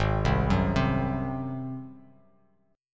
G sharp 7th G sharp, Bass Standard; G#7; arpeggiated; JGuitar

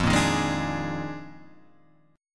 Eb Major 7th Suspended 2nd Suspended 4th F

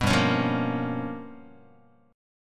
G 7th Sharp 9th Flat 5th, Guitar; G7#9b5 strummed; JGuitar

